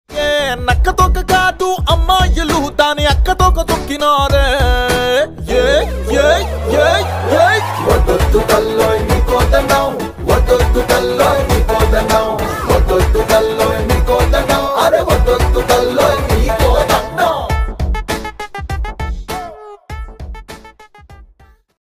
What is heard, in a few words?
tamil ringtone